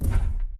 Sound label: footstep jog; Titanfall 2